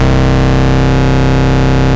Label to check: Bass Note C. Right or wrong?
Right